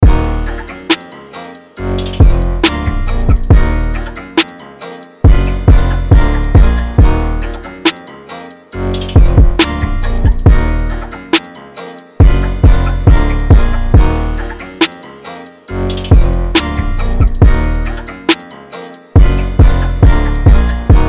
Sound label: 138 BPM Ebm; UNISON DEMO; Rovers